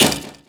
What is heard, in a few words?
metal scrap impact